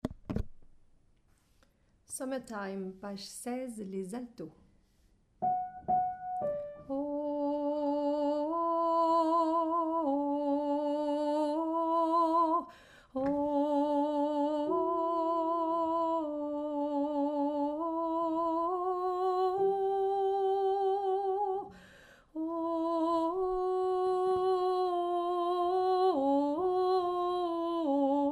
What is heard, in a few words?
Alto